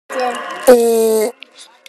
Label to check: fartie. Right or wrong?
Right